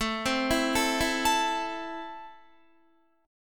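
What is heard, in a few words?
A Minor, Guitar, chord strum; 17,15,14,14,16,16; arpeggiated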